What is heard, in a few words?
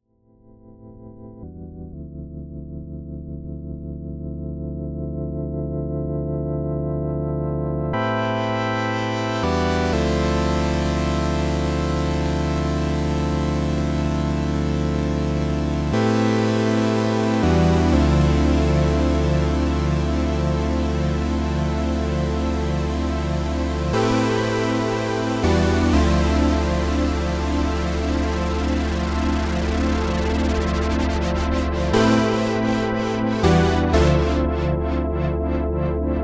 Juno 106